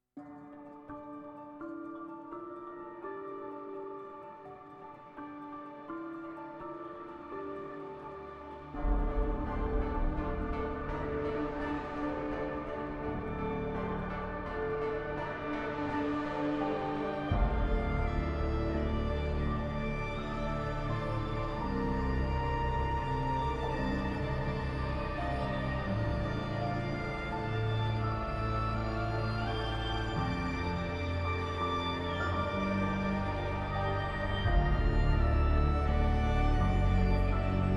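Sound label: background theme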